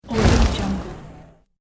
fire burn

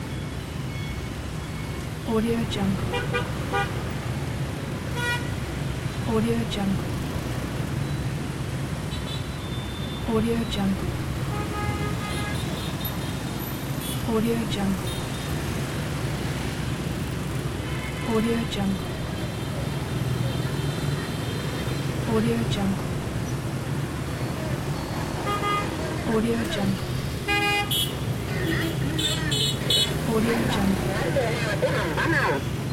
ambiance traffic busy vietnam hanoi loop

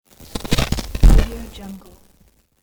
glitch sound

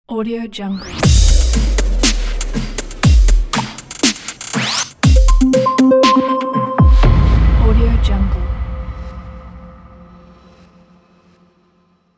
modern corporate tech logo; maxrazumov

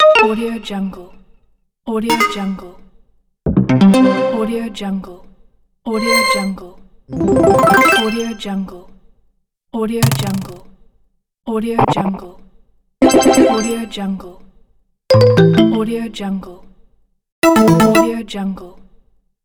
mobile gui fx

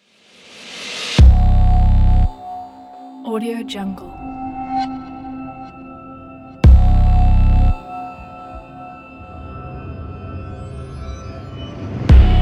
intense trailer

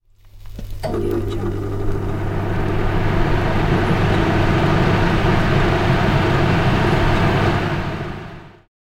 exhaust hood

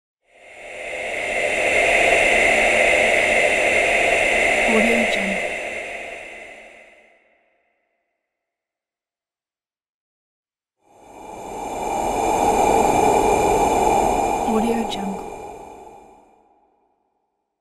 ghost whispers

